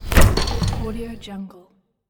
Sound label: opening a fridge